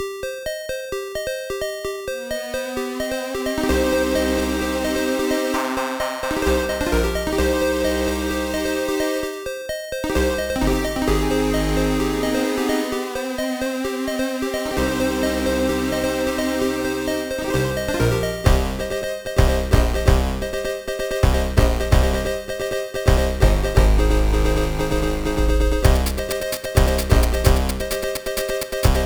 Mariachi Madness (8-bit); rayman legends the complete soundtrack gamerip 2013

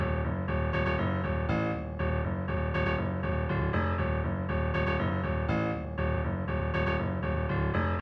String; The Bureau XCOM Declassified

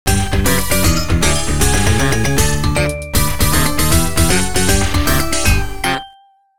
Game Over Music; Ordyne Original Game Soundtrack

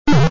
bump; Super Hermanos Mauricio

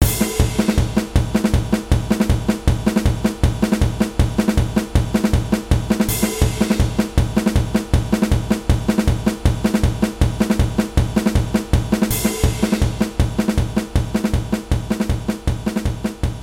grass battle middle boss; world grass

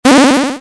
lose shield; Robot Wants Fishy

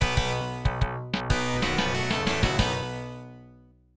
Game Start; Tecmo World Cup 90; arcade gamerip 1989

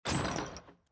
glider disassemble; out of reach treasure royale 2020 gamerip pc

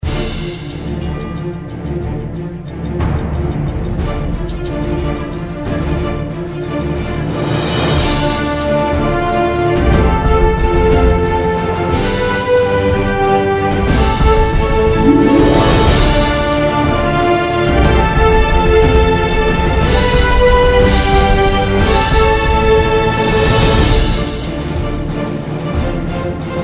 Bgm Cold Field

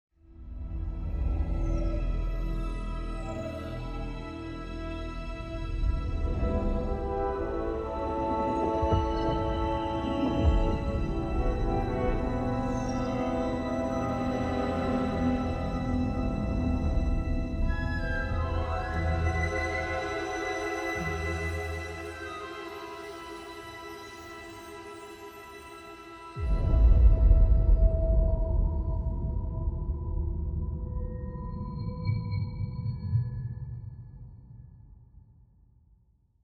Default Startup; Star Wars Jedi Fallen Order

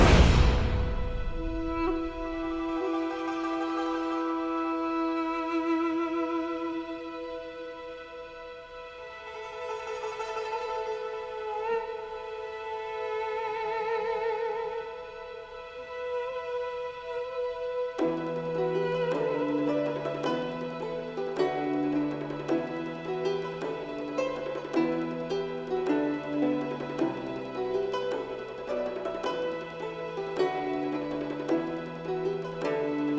Lobby Music Theme Loop; Gift of Parthax

